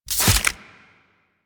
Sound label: Arch Gun Primary Beam Impact Flesh; Warframe; Archwing; Hildryn